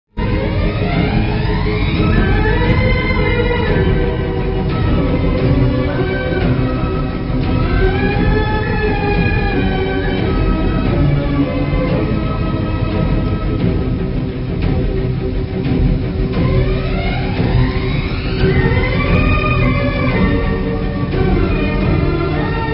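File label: movie sad background music ringtone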